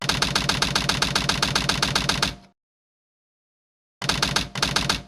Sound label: extended typing; Snapcube Sonic Adventure